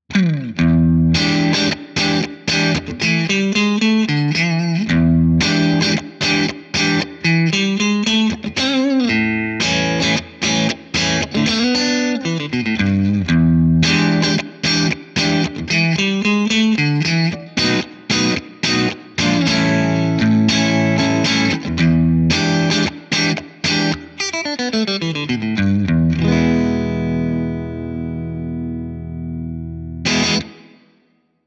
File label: breakup; jensentone